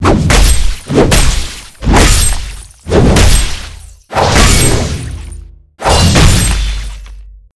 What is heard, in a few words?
Axe Swing Damage Sounds